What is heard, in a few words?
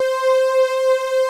STR HIT; Pro-Rec - Synthsonic